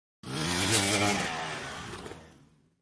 Motorcycle Motocross; By 80 Cc, Cu Fast By L / R, Shot Cu.